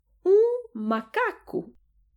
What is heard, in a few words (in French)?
singe